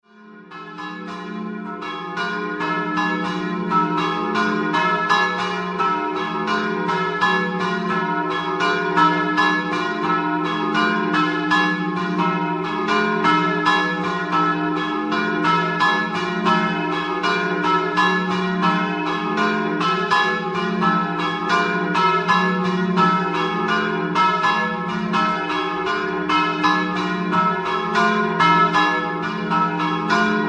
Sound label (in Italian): PORPETTO, campane